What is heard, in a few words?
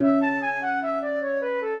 minuet, flute harp; Mozart